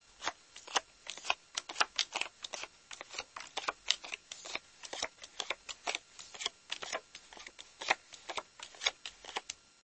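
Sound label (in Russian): раздача игральных карт; razdacha igralnih kart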